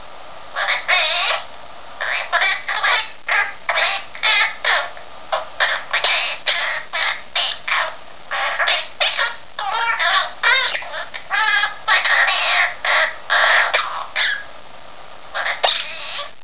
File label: duet